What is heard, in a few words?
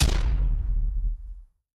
Cannon shots; SNKRX